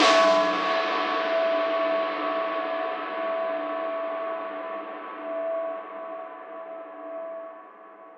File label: Dirty Snare